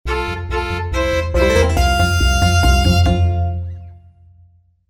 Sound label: Horse Race Goal; Ocarina of Time